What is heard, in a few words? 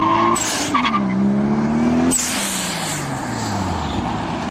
turbo nos; games; ringtones